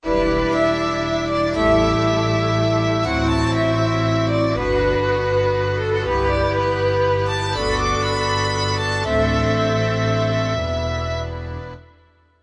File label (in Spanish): orquesta sinfónica